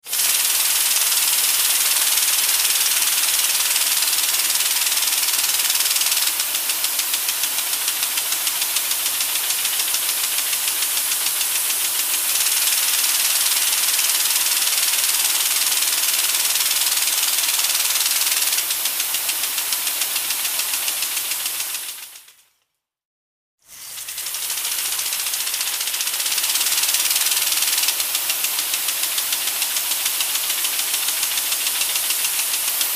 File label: Sprinkler 1; Constant Clicks And Water Sprays ( On Twice ). Medium Perspective. Lawn, Garden, Water.